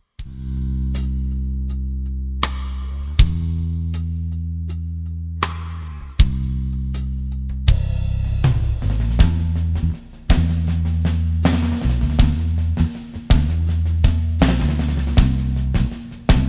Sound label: rhythm